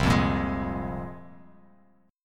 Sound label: C 7th Suspended 4th Sharp 5th, Bass Standard; C7sus4#5 strummed